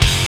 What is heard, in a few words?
GTR; Roland - LA Composer vol. 2